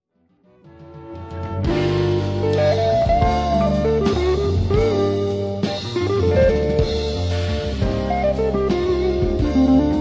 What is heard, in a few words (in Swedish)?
git solo